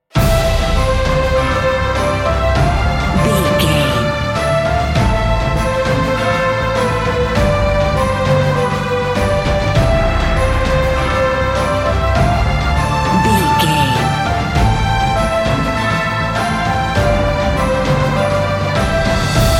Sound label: epic adventure